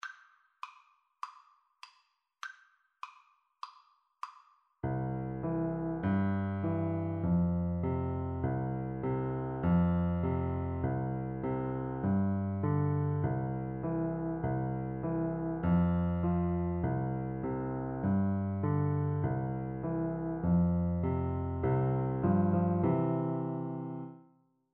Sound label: Baa Baa Black Sheep, Piano Duet